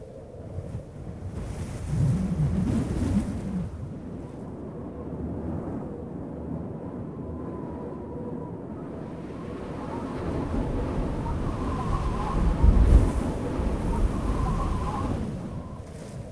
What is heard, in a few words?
snow wind; firefight